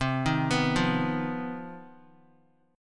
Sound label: C Minor Major 7th Double Flat 5th chord, Guitar; arpeggiated; CmM7bb5